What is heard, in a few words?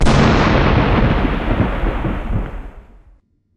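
thunder close rolling; zvuki buri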